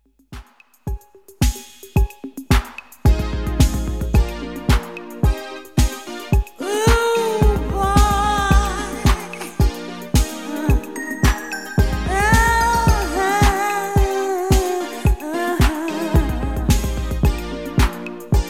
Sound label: Dj Intro Outro 110 Bpm